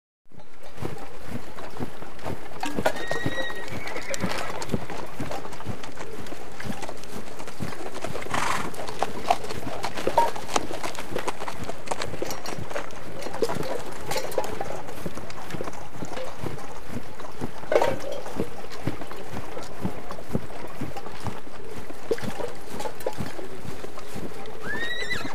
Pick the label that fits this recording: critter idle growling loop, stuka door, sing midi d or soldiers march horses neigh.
soldiers march horses neigh